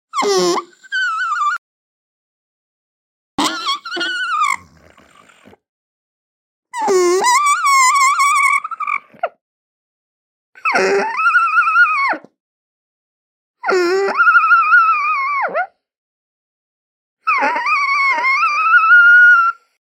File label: alpaca screaming